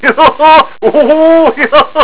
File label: LAUGH